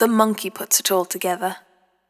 More Monkey, Vocal Samples, Sound Design; Sonic Foundry (Sony Creative Software); Pocket Diva World Class Vocal Samples

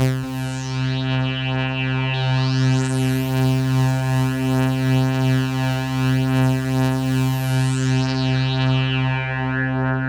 C3, SynthLine; 90 s Sample CDs; NiceBeats; SynthLine VOL-1

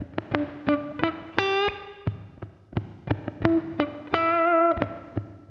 CLEAN, CLEAN GTR

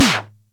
Music Percussion Computer Tom, Drum Machines

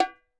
perc, Roland MC-909

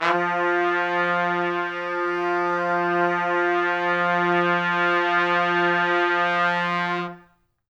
F2, Pop Brass; Best Service; ProSamples vol.19; AKAI

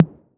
808 SOFT; Wizoo - Platinum 24 Electronic

